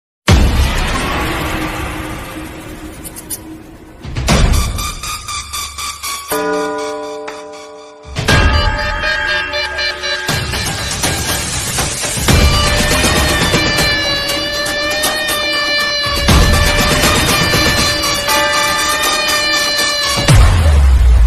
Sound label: trailer ringtone attitude ringtone; KGF chapter; rocky